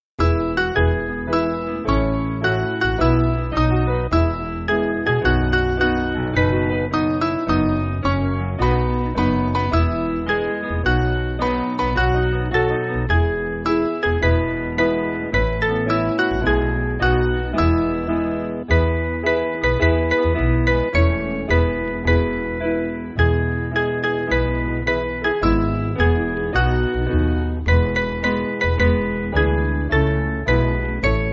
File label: Piano